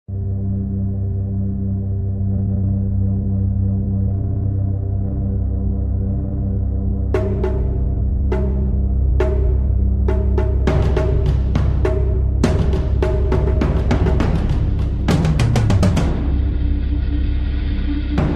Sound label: Apres la pluie music